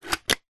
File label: industrial battery power tool insert into charging dock; ryobi